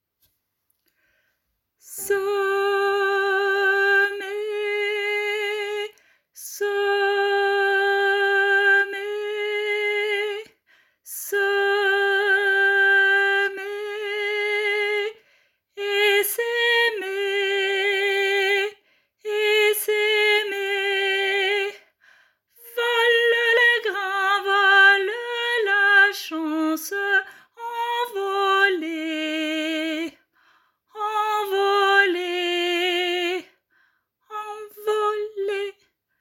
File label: Semailles alto; chants